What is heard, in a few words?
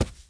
bodyfall marble